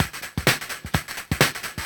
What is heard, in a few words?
FX Loop 128 BPM, House; Best Service; House Definition VOL-1